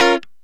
Lounge Guitar Chick 9ths D#3; Lounge Guitar Chicks

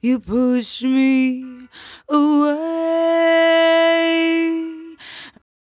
57bpm Am, Velvet Vocal; Zero-G

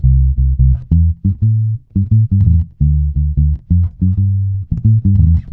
C, dub, bass; Spectrasonics; Bass Legends CD 1; JOY DUB

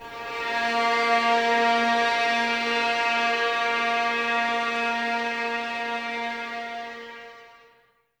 VS A#3, Violins SulPonte; Miroslav Vitous; 11 Violins

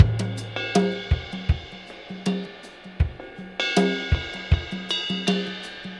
Tom Groove, Laidback

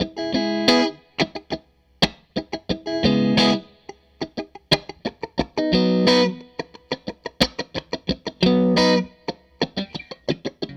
Strat Guitar Loop

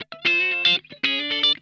OCTALICK, Funk Guitar; Zero G - Funk Guitar

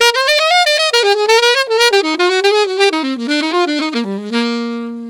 Alto Melody in Eb, Sax; Sonic Foundry (Sony Creative Software)

